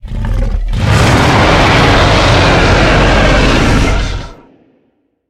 Rex sound

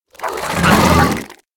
Eurypterid Attack Sting

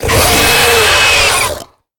Troodon sound

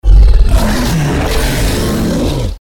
Megalosaurus - Roar

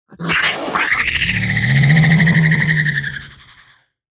Seeker sound

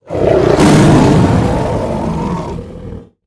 Thylacoleo sound

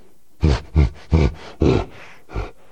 Bigfoot Idle

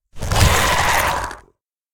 Arthropluera sound

